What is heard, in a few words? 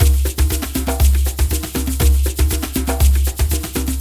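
Djembe; Spectrasonics; Heart of Africa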